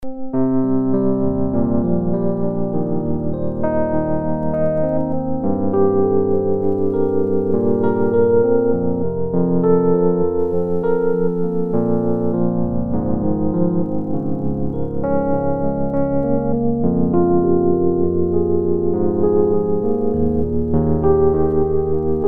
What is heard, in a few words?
Syntheway electrikeys vintage electric piano; daniel laiseca